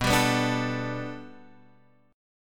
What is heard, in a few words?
B Minor 7th Sharp 5th B Guitar Standard, chord; Bm7#5; strummed; x,2,5,4,3,5; JGuitar